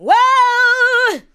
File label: ADLIBS